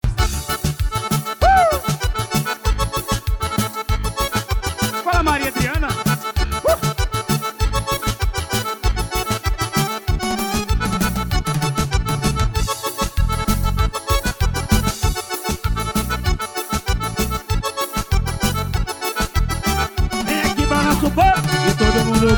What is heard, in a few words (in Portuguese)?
forro